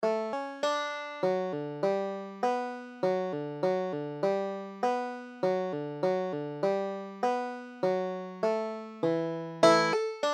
hornpipe, banjo

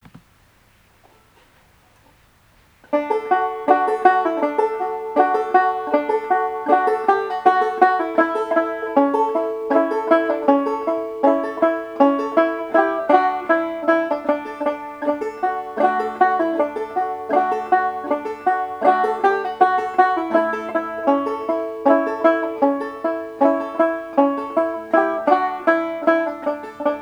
golden slippers D, banjo

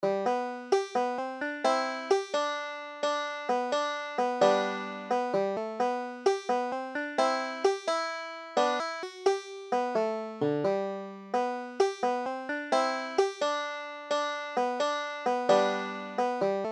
poor old soldier, banjo

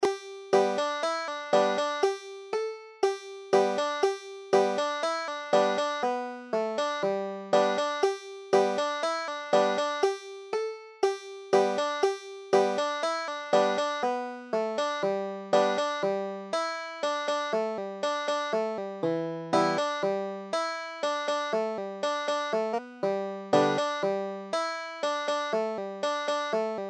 greasy meat, banjo

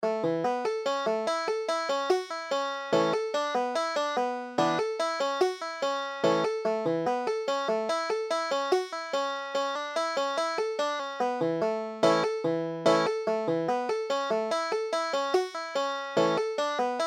buffalo gals, banjo

